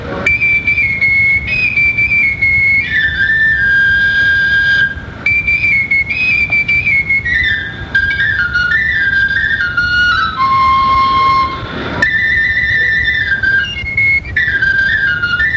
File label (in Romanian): Hora Ciobaneasca, joc popular vasluian; Centrul Judetean pentru Conservarea si Promovarea Culturii Traditionale Vaslui